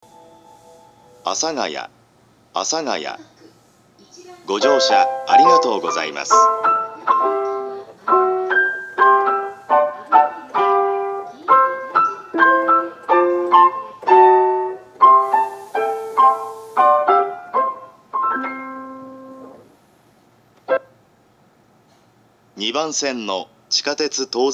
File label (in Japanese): 発車メロディー（たなばたさま）, ※Aの音声には到着放送が被っています。; 阿佐ヶ谷ＶｅｒＢ; 曲は「たなばたさま」です。; 当駅の中では最も鳴りやすく、夕方に粘れば余韻までは期待